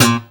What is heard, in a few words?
GUI Pull A2# Akus; Best Service Gigapack 2